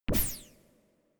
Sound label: space laser gun double shot empty; krieg-waffen; soundpool